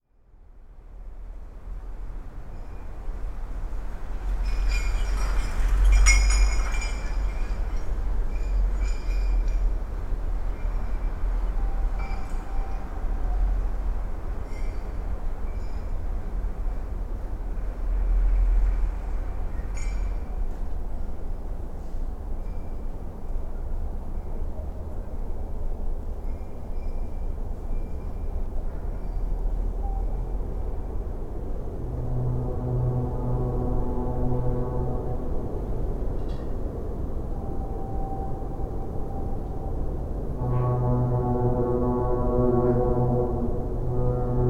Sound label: trains, sergiyevposad